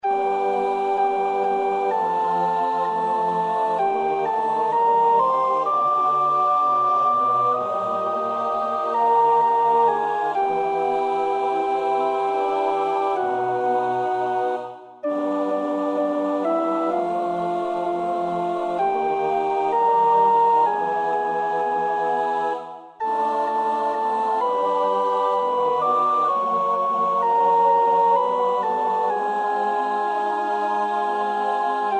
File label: Ave Maria, sopraan; Witt (Robert William); Four Motets to the Blessed Virgin Mary, opus 29; II. Ave Maria